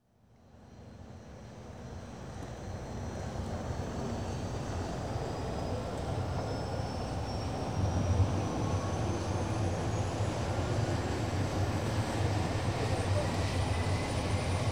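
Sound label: Dash 7 Aircraft — Airport Apron Atmosphere; England; BBC Sound Effects Library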